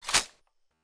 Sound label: ak47 clip in; weapons; rif ak47; beast